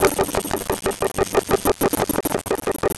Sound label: buffer fuct loops